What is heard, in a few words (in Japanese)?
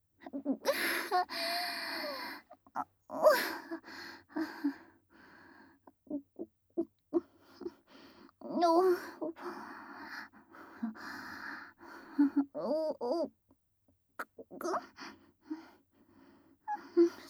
発情オホ声ループトラック, 効果音なし, ASMR; 異世界シスターの隠れた品性～村一番の清楚シスターさんの本性は、オホ声下品アクメ好きなよわよわ最弱おまんこの持ち主でした～